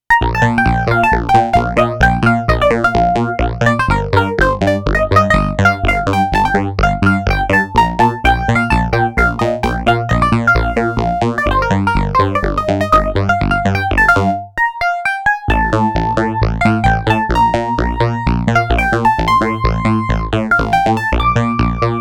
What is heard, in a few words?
reface cs; bubble bobble; yamaha; mess arounds